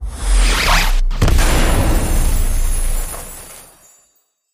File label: pre Bigwin; jumanji